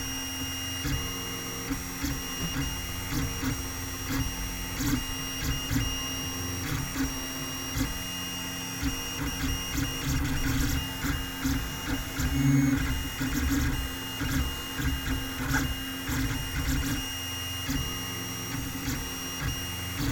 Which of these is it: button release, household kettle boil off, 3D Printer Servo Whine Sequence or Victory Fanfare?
3D Printer Servo Whine Sequence